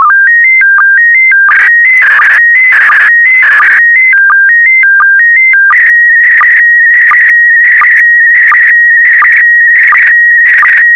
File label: sstv; VK4MSL